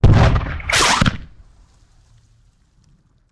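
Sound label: Cannon Cannonball; Sound Ideas; Guns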